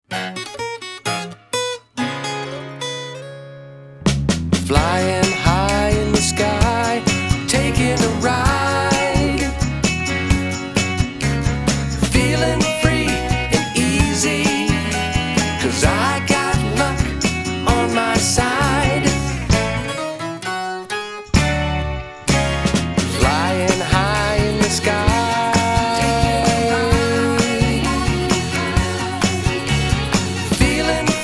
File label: Vocal, Music